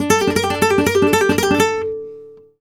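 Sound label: Guitar Picado, Flamenco, g minor; Picado 2; Best Service ProSamples vol.46 - Flamenco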